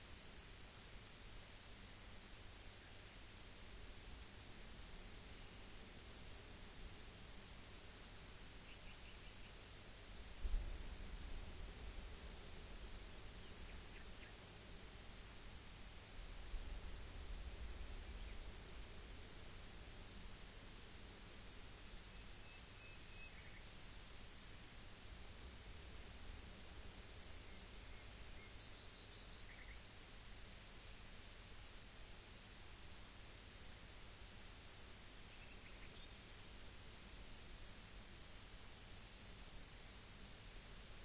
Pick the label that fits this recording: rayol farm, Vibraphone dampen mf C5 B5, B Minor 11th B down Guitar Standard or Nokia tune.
rayol farm